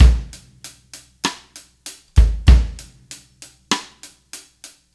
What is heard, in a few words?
patient man, Drum loops Boombap